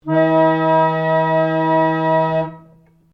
ORGAN PUMP CELLO E3 OCTAVES CLUSTER, Old portable church organ; Keyboard Sound Effects; Keyboards (Sound Effects:Musical)